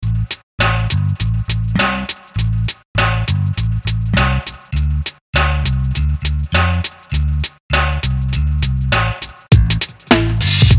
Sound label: Drums and Bass